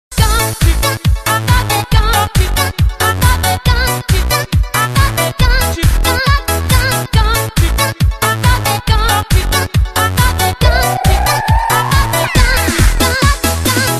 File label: Sms Dj Mix